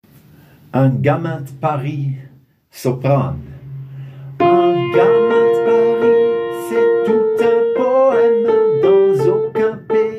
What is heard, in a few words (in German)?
Sopran